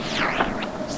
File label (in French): declench TV; Mobiclic46